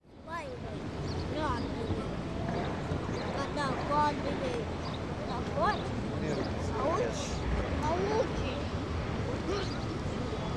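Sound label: detskie golosa, children's voices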